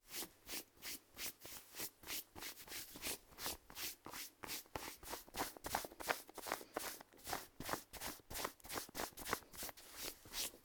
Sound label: brushing clothes; human; NS Studios - ultra sound collection